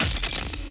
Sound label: Scribble, FX Effect Sounds